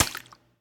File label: butter; Plants vs. Zombies